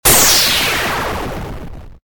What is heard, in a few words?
core explode; Mindustry